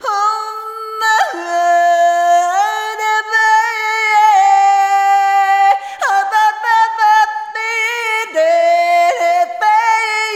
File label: Arrhythmic F